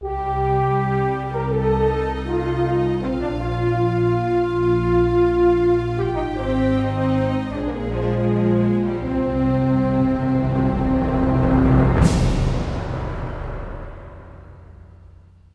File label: defeat music; mechwarrior 4 veng